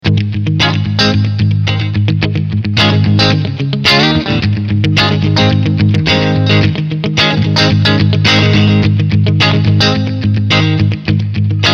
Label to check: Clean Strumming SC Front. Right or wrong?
Right